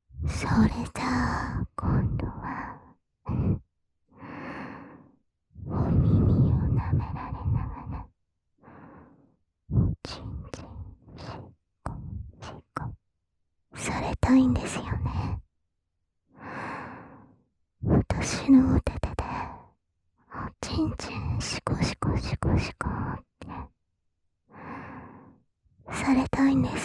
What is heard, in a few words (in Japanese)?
耳舐め; 耳舐め手コキ300円コース; 反転